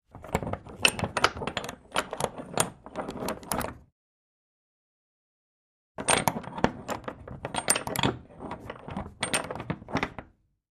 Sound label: Padlock Metal Hooks